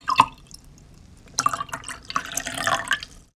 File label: Whisky Pour